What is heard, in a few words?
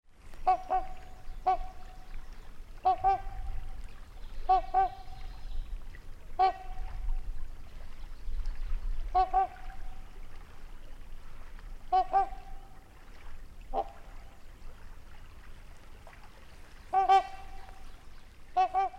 Trumpeter Swan Trumpets near Lake; Wisconsin 2019